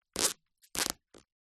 office sticky tape stuck to floor foot or hand rub squeak